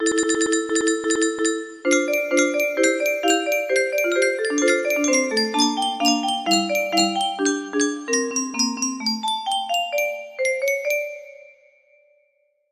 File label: music box